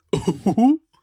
yelp; Fat Grubfather

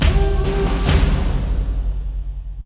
Lottery Battle; Kingdoms & Lords

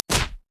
Hit

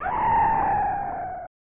scream; snes

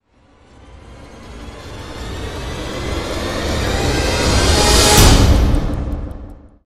POWER STRING SWELL HIT; gamerip

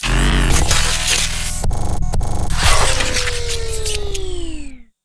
Spawn; Raypunk

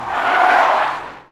Kart Drift; pac man kart rally android

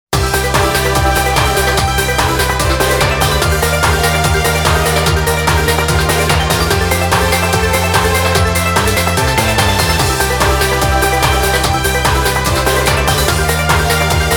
Roulette; Akane Roulette; Witchmaster Sound Collection

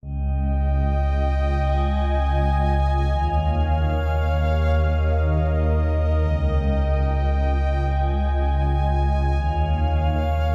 battle; Android

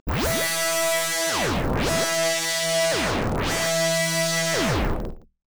Warning; AMS II Take Revenge for the Ruined Master; x68000

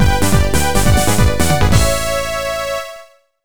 Jingle Start; Harvest Moon: Frantic Farming; NDS

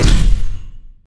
Large Neutral Impact; Idle Wizard

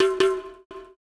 Bongo

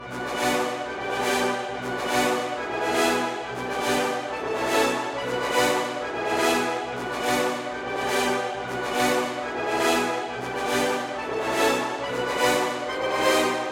Sky Diving brass; ice age scrats nutty adventure

